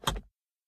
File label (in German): auto gangwechsel; minecraft